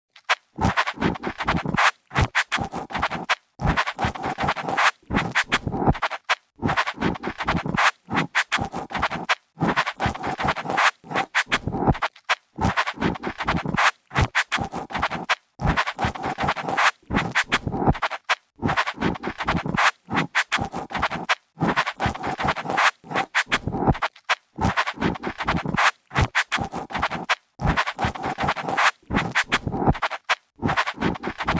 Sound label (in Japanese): 複数本の耳掻き棒が同時に挿入されたようなハードスイービング (右); 阿修羅